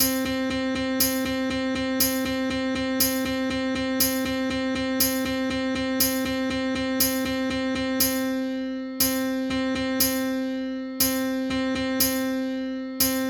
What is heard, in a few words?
rhythm; Music 101; Dotted Eighth Notes